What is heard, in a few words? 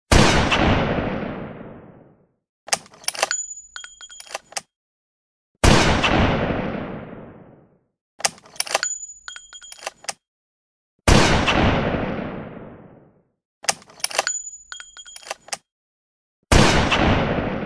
M40 sniper sound